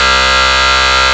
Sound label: rave beep C1; Best Service Alpha Dance vol.2